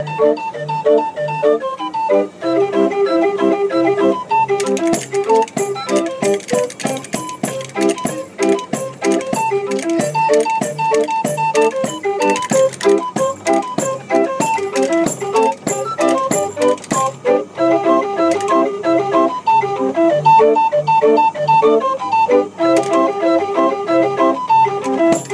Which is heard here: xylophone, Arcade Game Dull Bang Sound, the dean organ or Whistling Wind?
the dean organ